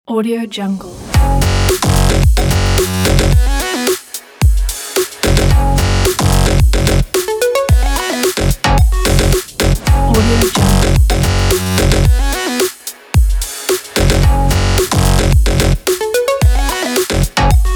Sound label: electro trailer